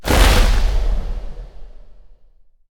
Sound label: arachne webground impact; smite